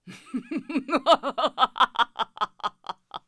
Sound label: laugh